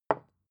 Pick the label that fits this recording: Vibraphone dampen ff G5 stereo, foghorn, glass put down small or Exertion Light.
glass put down small